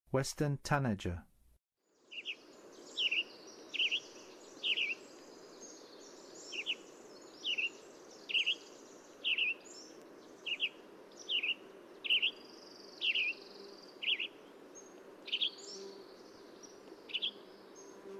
Western Tanager, Songs of Birds of North America; Western USA; Western USA Songbirds

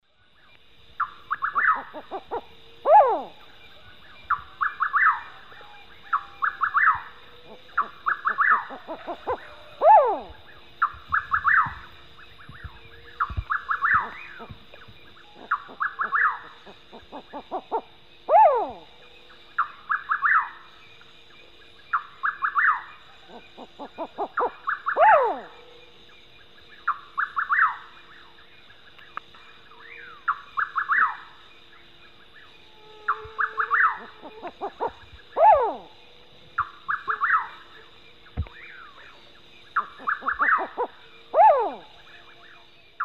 Ciccaba huhula, call; S. America; Eastern Lowlands

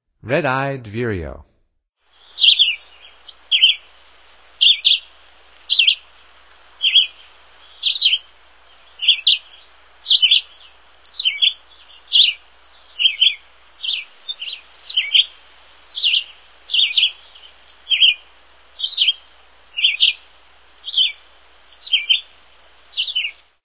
Red-eyed Vireo, Songs of Birds of North America; Stokes

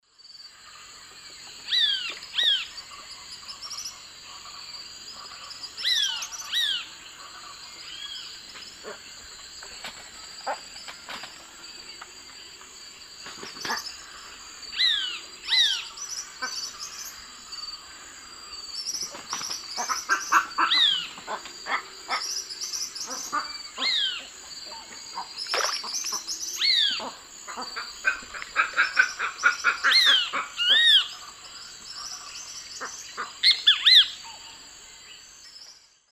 Pitangus sulphuratus, dawn song; S. America; Eastern Lowlands

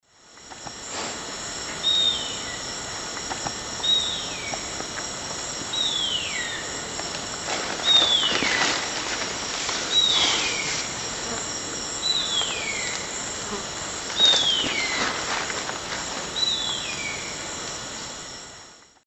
Harpia harpyja, calls; S. America; Eastern Lowlands

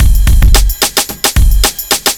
block rock kit

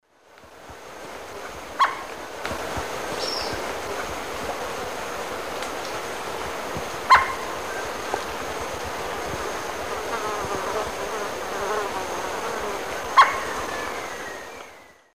Xipholena punicea, call female; S. America; Eastern Lowlands

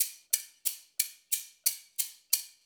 Gold Cylinder Shaker; Rock; Percussion

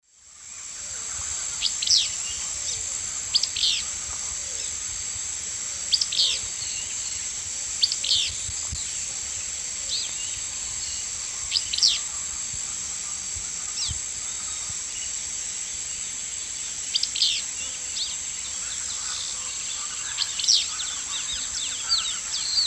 Empidonax traillii, song; S. America; Eastern Lowlands

